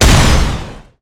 Enemy Fashion 1st Hp Damage Shot

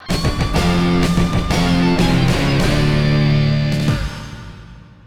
Level Completed; Duke Nukem Land of the Babes; PS1

